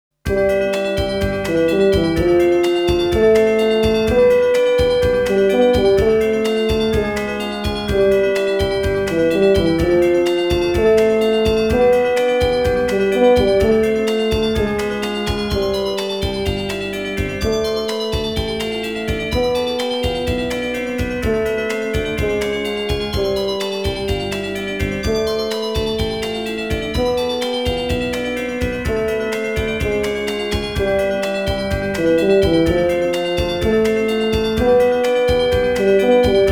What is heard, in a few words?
Synthesizer Version; Super Famicom Magazine Vol.11